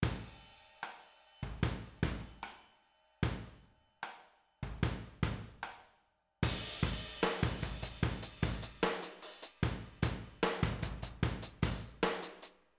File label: drums overhead; trackplug